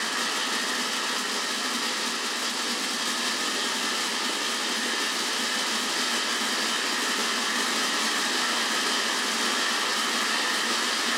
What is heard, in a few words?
90 Texture Grains, Granular Synth Loops; RustedBronze; Hydrosphere